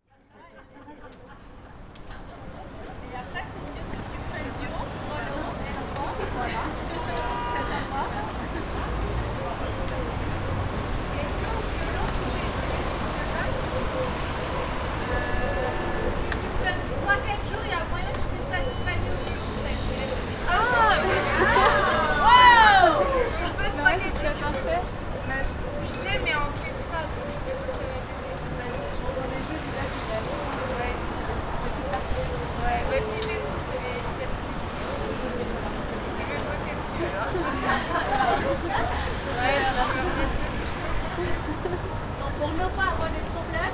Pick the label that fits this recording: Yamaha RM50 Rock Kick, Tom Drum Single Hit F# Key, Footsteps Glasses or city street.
city street